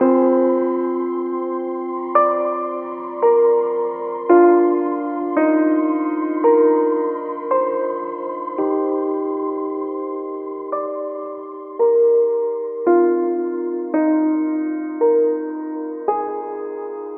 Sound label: piano and keys wistful, sad loops